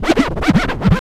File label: FX scratch